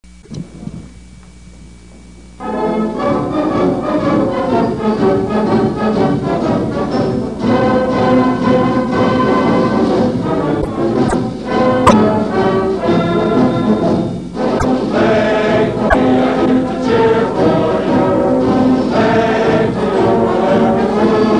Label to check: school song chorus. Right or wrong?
Right